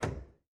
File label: stick pitch lim, aircraft; DCS